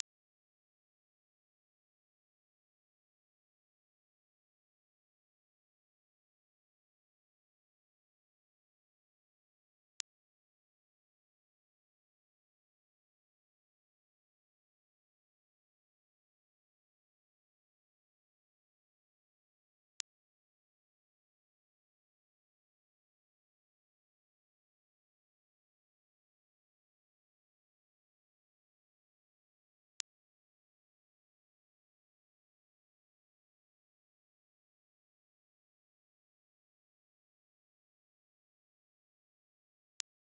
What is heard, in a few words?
clicktrack 6bpm two sample impulses